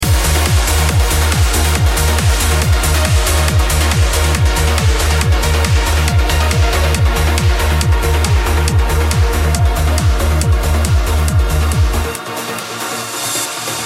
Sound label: Uplifting Transition, No-Talking DJ Mix; Uplifting Only 671